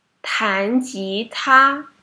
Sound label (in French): jouer guitare